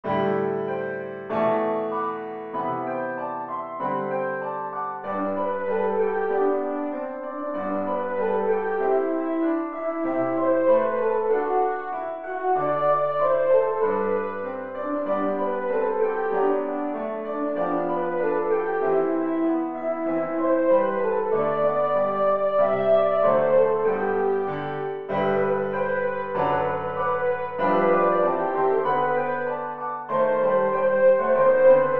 jingle bells; pierpont james

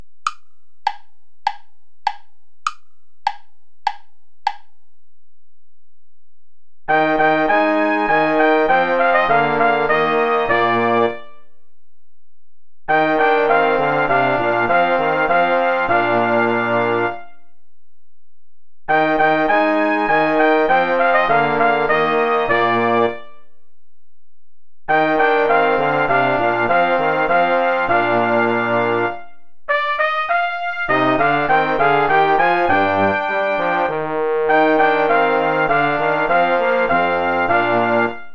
play along without trumpet

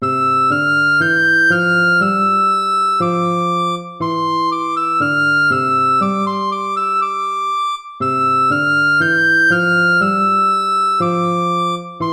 gavotta; hook james

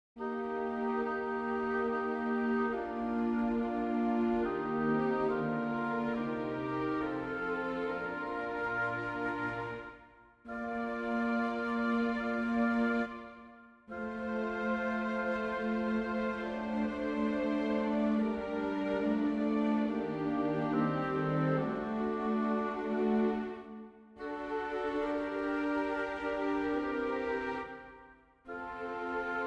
for winds strings; purcell henry; thou knowest lord the secrets our hearts

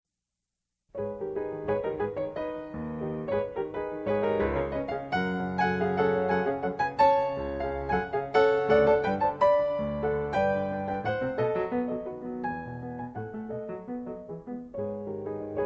waltz

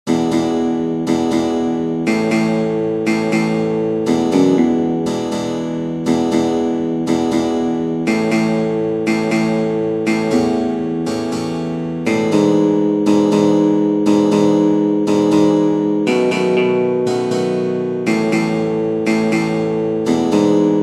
progression blues

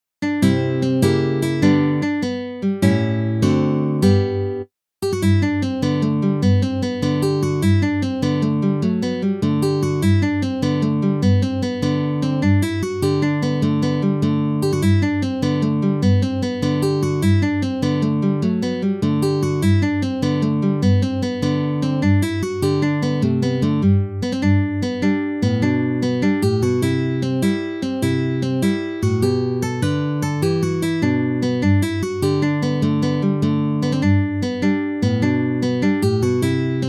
march

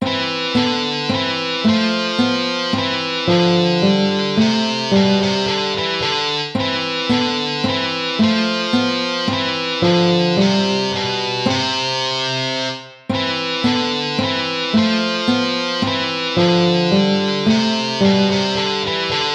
tempo minuetto; hook james

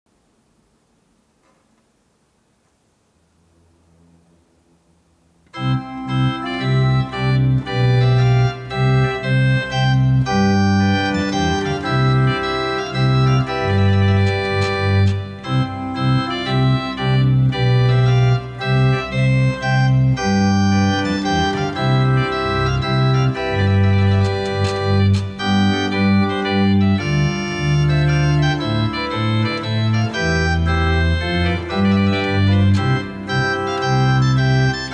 menuet pour les trompettes organ transcription; lully jean baptiste

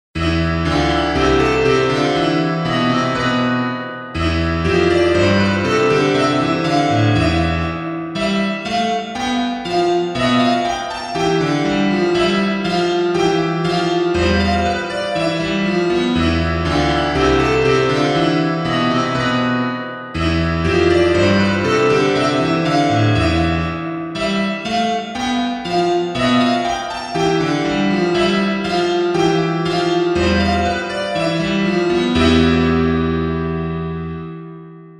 piano phrygian; piano lesson no3

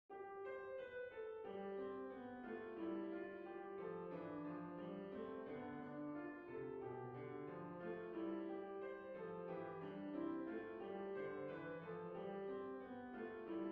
canon; wolf hugo

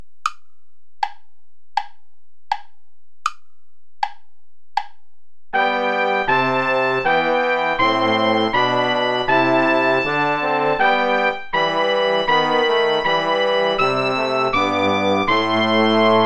play along without trumpet; reger max the silent moon risen

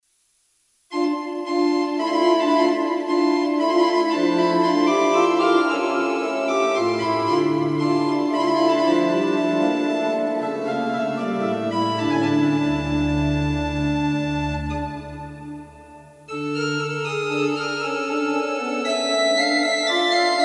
prelude for organ; paul page

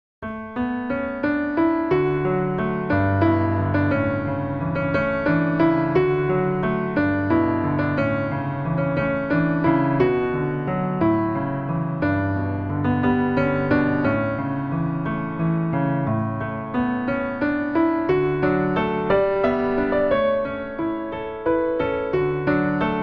old button accordion

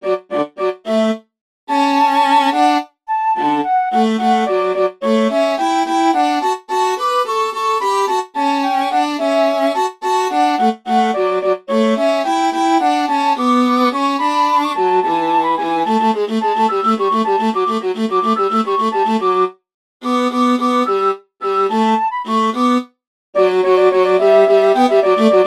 for flute viola; mozart wolfgang amadeus; bei ma nnern welche liebe fa hlen

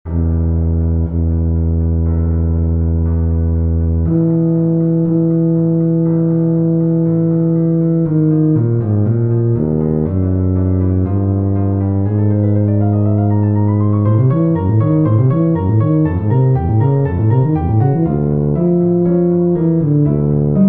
romantica

